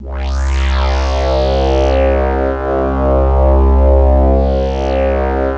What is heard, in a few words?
SWEEP; East West; Global Sounds; CS70M YAM